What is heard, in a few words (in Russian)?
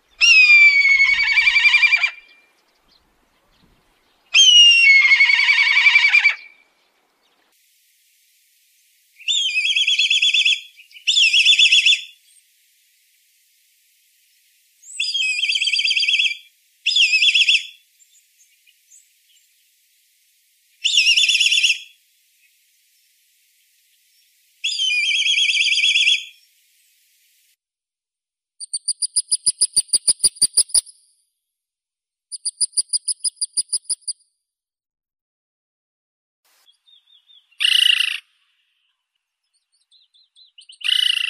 chernyi korshun